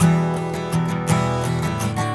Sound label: Acoustic Guitar; Guitars